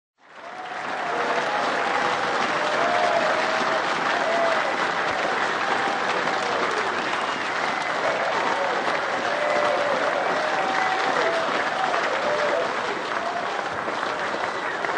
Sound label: applause; reversevape